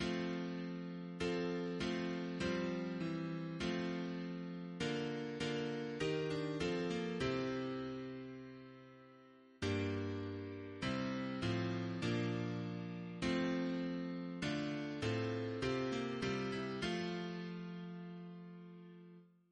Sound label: Double chant in F, Organist; Hurd